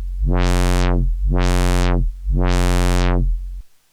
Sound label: Monotron Sci-Fi